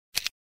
daktilo sesi

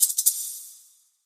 yilan tislamasi

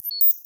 bip sesi

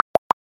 balon; Balon Sesi 3

